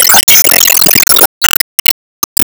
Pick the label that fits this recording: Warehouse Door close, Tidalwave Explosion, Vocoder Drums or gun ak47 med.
Tidalwave Explosion